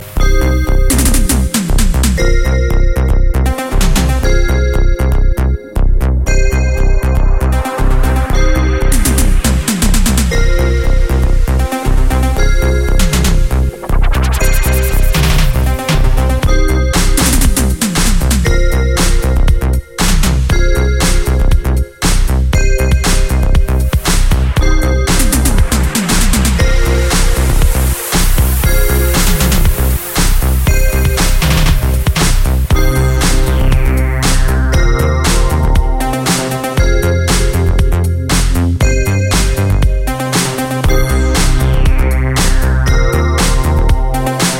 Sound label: Italian dreams (instrumental); Disco